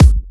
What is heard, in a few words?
Electro Kicks